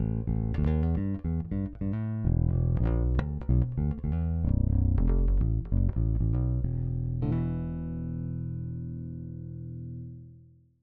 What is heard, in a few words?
Split Jazz Passive Neck, 5-String Pickup; Fralin